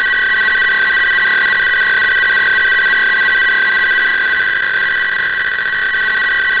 arq

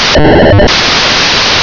1k2 FSK sample AUDIO; SR-0; DemoSat

